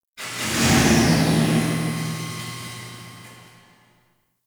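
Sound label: Large Cargo Room Random Ambience; Warframe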